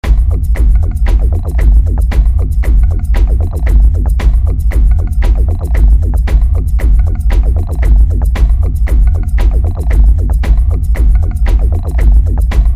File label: Back Beat; WebJukebox